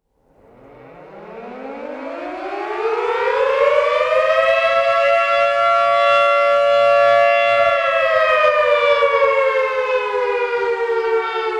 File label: Glissando Siren